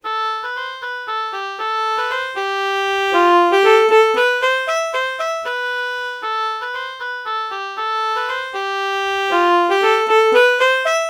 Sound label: alto saxophone oboe melody morph